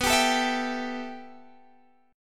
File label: B 7th Suspended 4th Sharp 5th